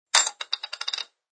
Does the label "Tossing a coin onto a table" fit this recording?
Yes